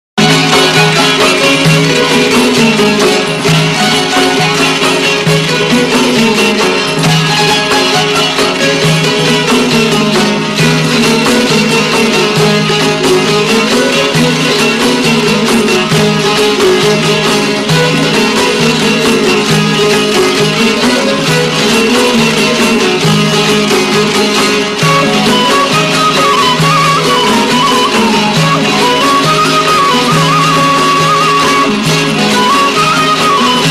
Instrumentale; Kcim Valle

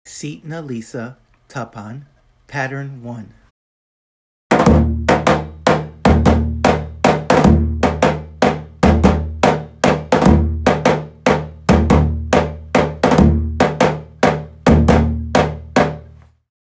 Sitna lisa tapan

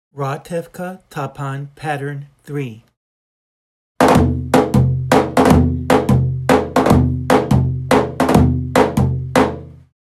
tapan; Ratevka

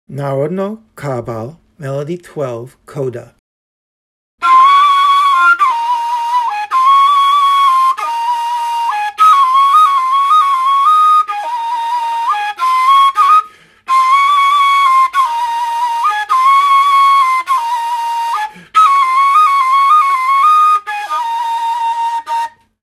kaval melody coda; Narodno

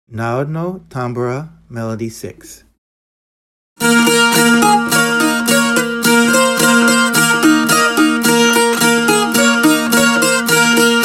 tambura; Narodno

